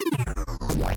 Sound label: game lose effect